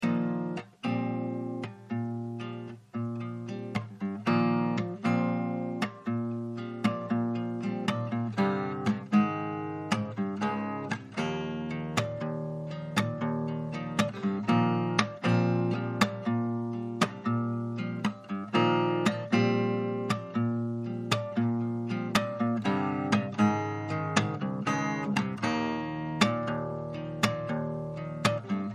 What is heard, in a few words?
Groove